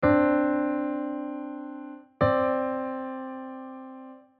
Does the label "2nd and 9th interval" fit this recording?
Yes